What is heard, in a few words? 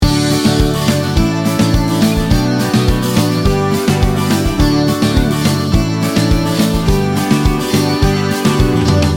pure sunshine loop